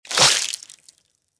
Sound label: sword hit; fertig classic games